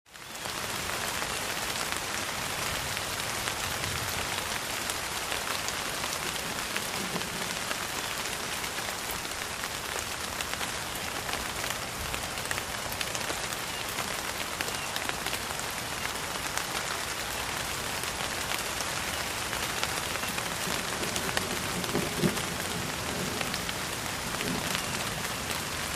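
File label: Rain Steady Rain On Leaves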